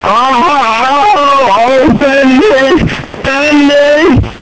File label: belly rolls; firearms